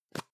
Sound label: plastic can lid screw open medium; objekte; dose